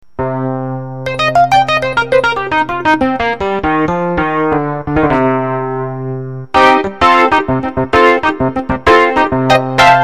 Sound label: Gentle Vintage E Piano; KeyStar